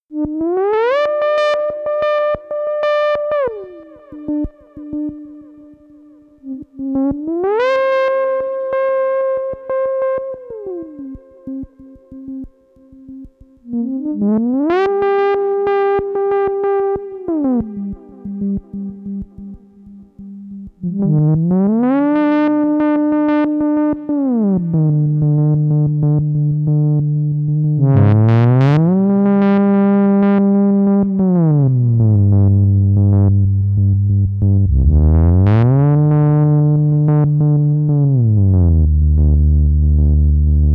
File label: Jupiter 4 lead solo